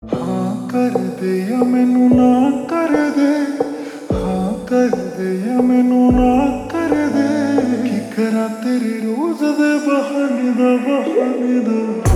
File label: Ringtone Slowed Reverb